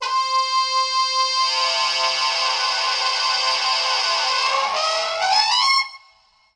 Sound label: sax blows acid; Horns and Brass